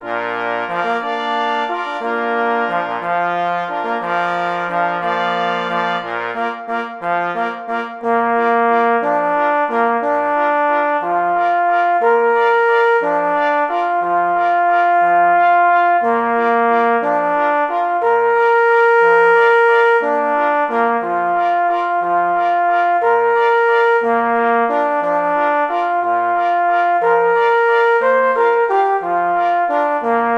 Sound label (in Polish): walczyk 2 plesy i 2 parforsy; Poznanski; od humoreski do walca zeszyt v